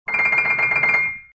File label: spray